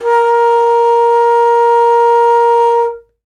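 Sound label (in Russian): флейта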